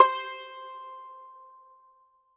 Plucked Sitar C5; Acoustic and Electric; Polyend; Simulacrum Sounds; 1 Shots

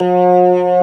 B3 G 1, ORGAN; VOCAL ORGAN

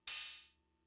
Cymbal; Fricke MFB-522

